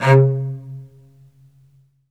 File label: SOLO CEL, Strings; Synclavier; ILIO